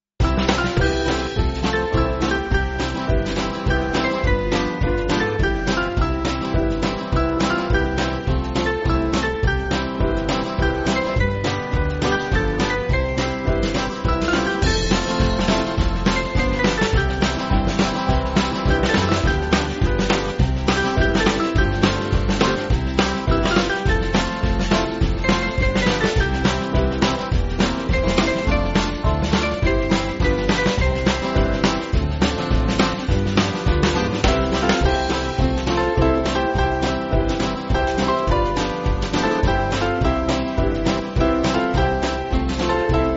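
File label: Band, Music Backing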